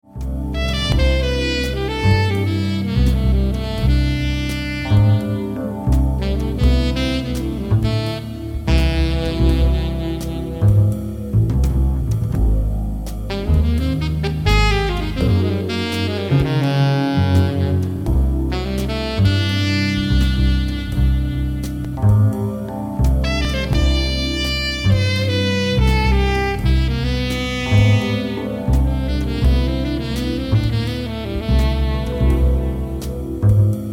Song Alto; Dukoff P8

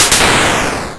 thunder

